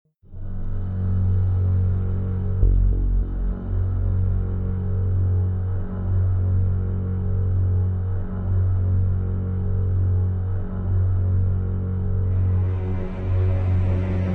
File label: music